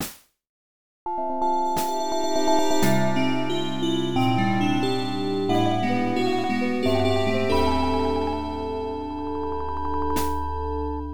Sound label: Space Battle Victory; Star Trek: 25th Anniversary; MS-DOS gamerip 1992